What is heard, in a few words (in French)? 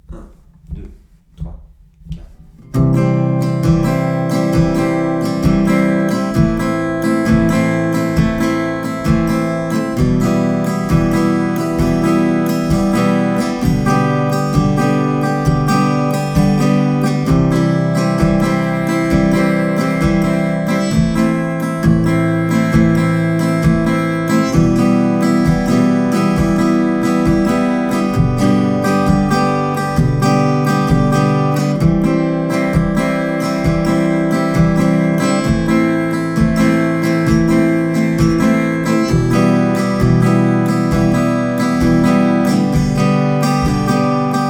double croche double; théorie rythmiques doubles croches